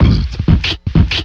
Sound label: hitted, Beatbox; Drum loops